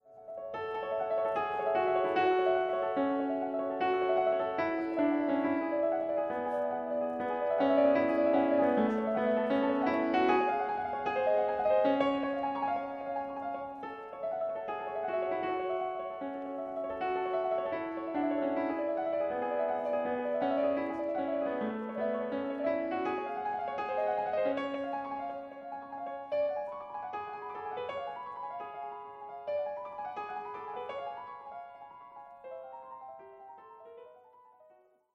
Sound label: piano